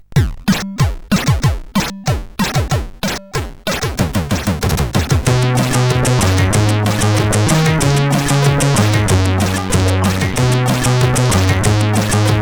Tape Glue, sid CSG8580R5; Salthe Morten

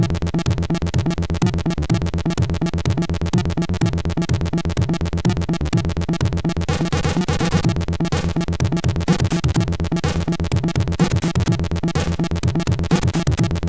Techno, sid, MOS6581R4

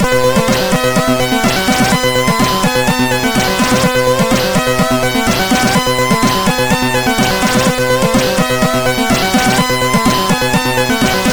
Trance, MOS6581R2

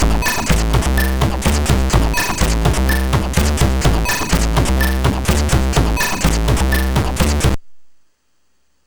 sid, MOS6581R4

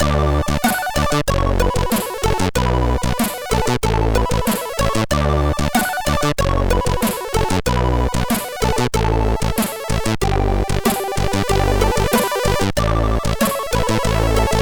MOS6581R3, sid; FanFarewell

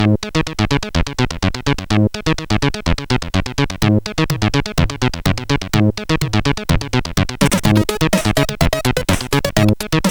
Groove, sid CSG8580R5

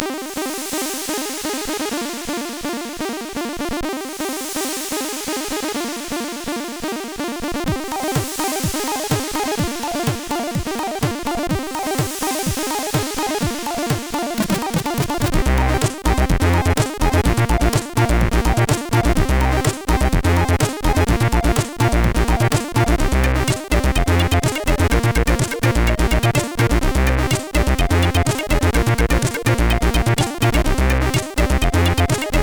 CSG8580

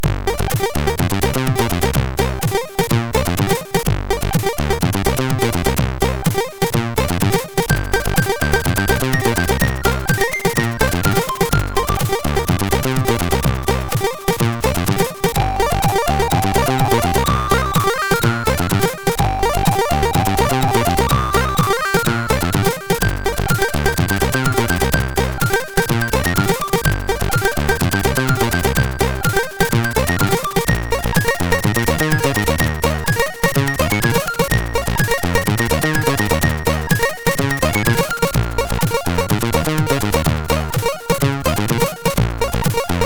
Techno Mix, MOS6581R3

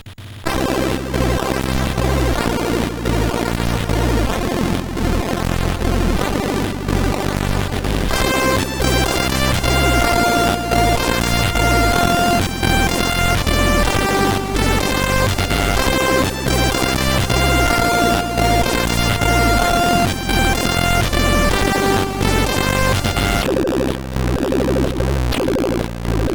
Beat-Box, CSG8580R5

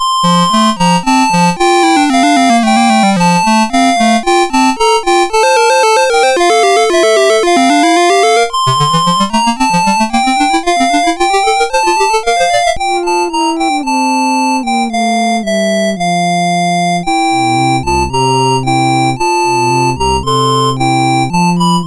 Deck the Halls, MOS6581R4

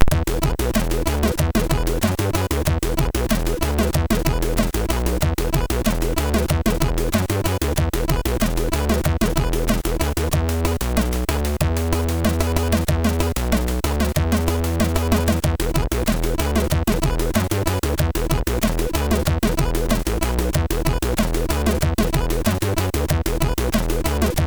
Weird Bass Rule, MOS6581R3

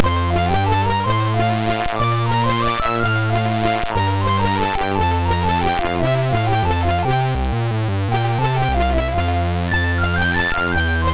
Menuet, MOS6581R3

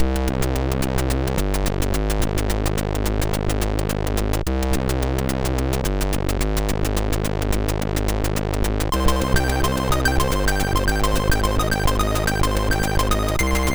sid, MOS6581R3

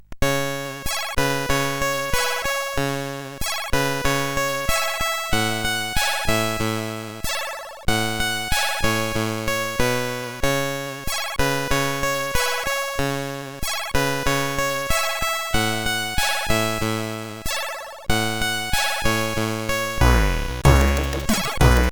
Lullaby, CSG8580R5

